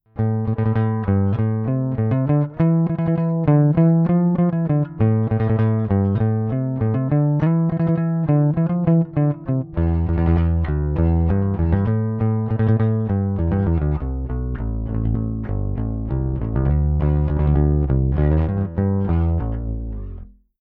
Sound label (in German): Bass Rickenbacker DI Signal EQ nach Wunsch